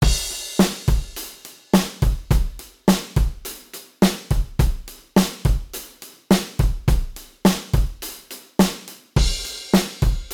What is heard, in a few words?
modal surf, drums